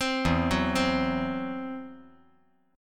E Suspended 2nd Sharp 5th

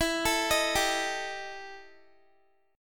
E Minor Major 7th Double Flat 5th, Guitar; arpeggiated

